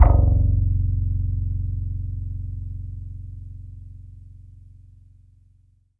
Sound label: SLAP C2; Greytsounds; Scott Peers Sound Engineering